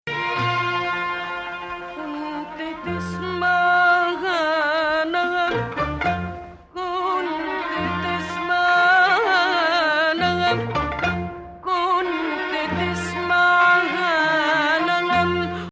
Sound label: Ajam Ajam-flat6; Ajam, which resembles the Western Major scale, has two distinct versions